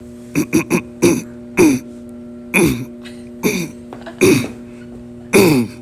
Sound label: grunting clearing throat